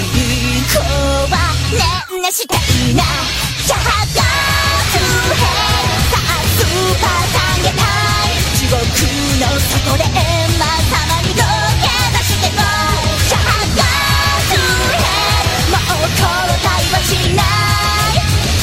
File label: Lullaby